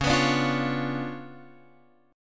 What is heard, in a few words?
C sharp 7th Flat 9th, Guitar, Open F; C#7b9; strummed; guitar chord